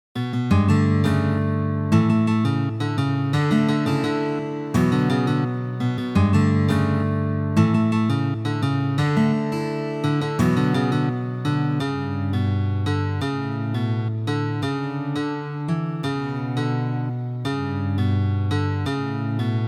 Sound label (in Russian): melodija na gitare